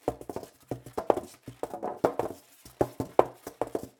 Styrofoam Roll; moul-assets

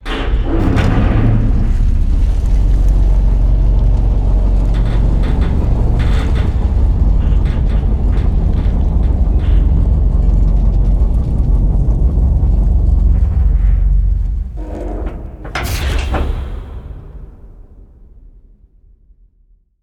Vault Door Close

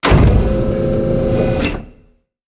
Silo Beam Collar; erca; City

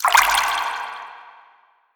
Random Water Splash

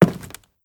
Soft Wood Walk

